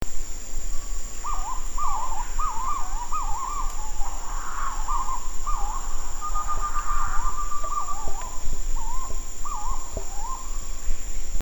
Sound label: Purple throated Fruitcrow Querula purpurata